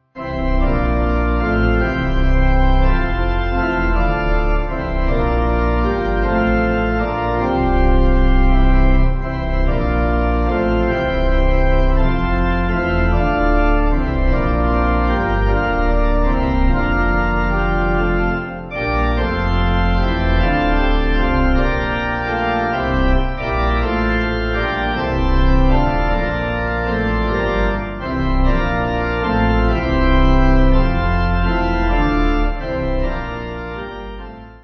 When I Behold, Pipe; Organ; Min Aynet Fiqir New

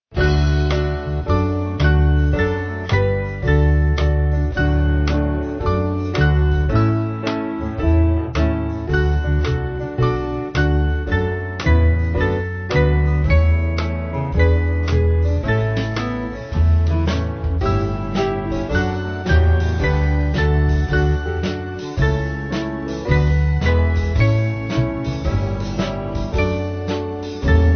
Band; Break Thou The Bread; Sherwin

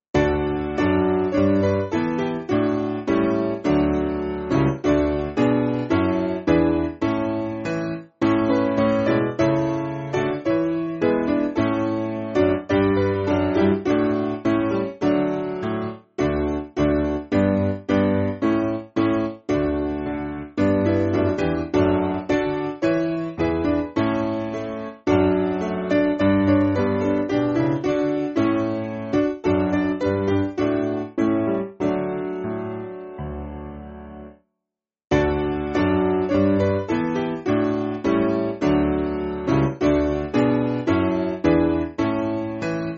Piano, church music

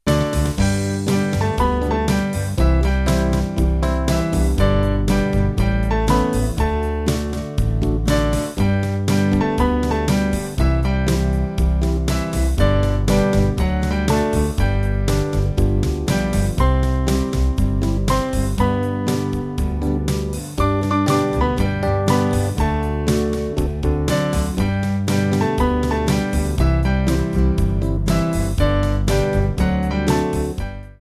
Band

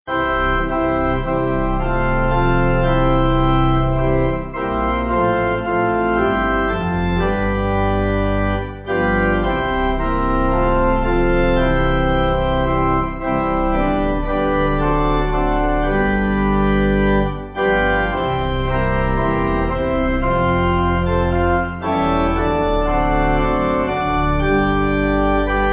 Pipe

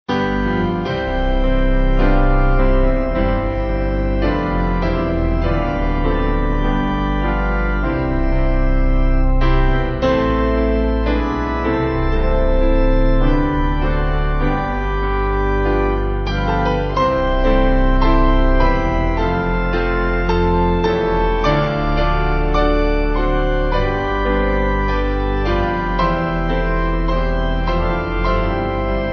Piano Organ

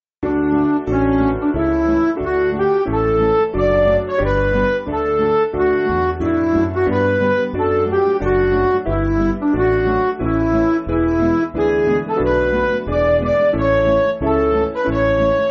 Midi

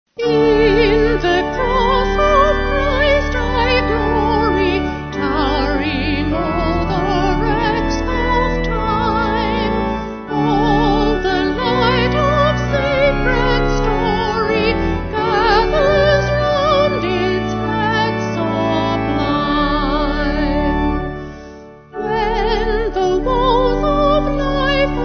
Voice Organ, Pipe Organ; Vocals & Organ; In The Cross; St Oswald

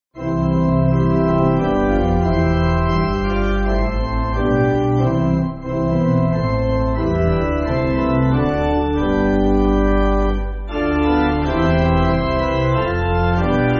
Pipe, church music; I Sing The Almighty; Montrose

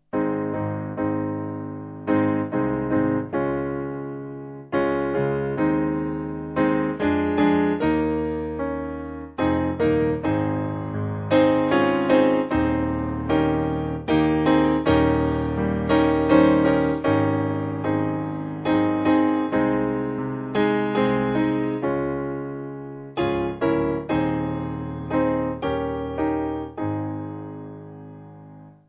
piano

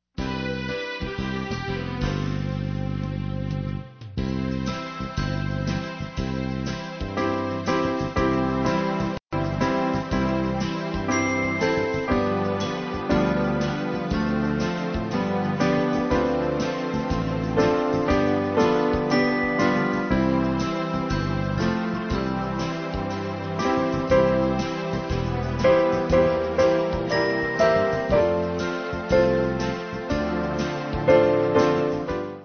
Key B

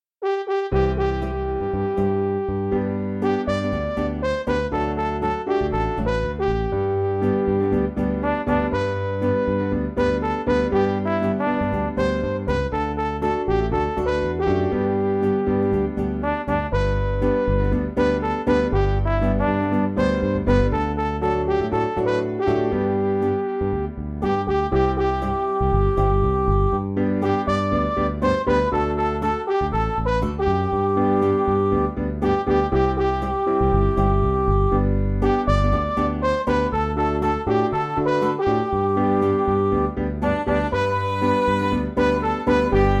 We Are Marching, Siyahamba, Midi

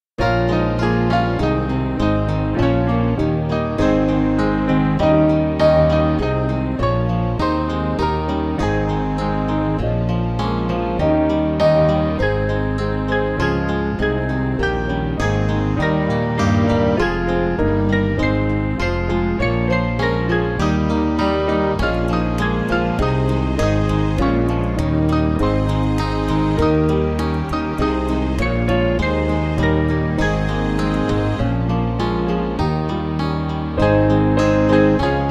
Spread O Spread, Band; Dent Dale

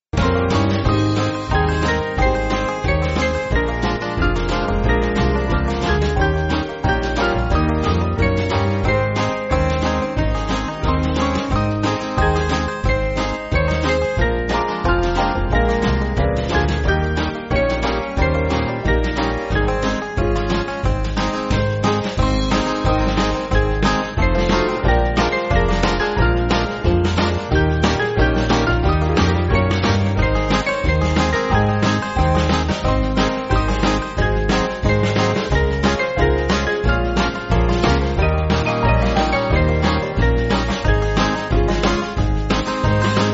Band; J. Edwin McConnell

